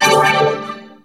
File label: Pickup Magic; SFX Kit; Pickups